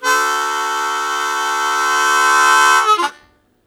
Blues Chord Attack D1, Harmonica; 90 s Sample CDs; Zero G

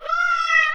CAT, Anime Sound FX; COMIC

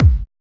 4 2 FLOOR K, HOUSE KIT; Drums In Your FACE!

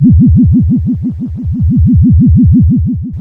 C#, Synclav FM, FX; Big Fish Audio

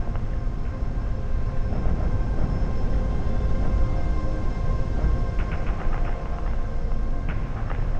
Drone Ambient; Drums & Drones

